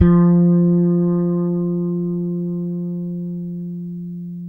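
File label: fretless bass; Spectrasonics; Bass Legends; 6-STR FRETLS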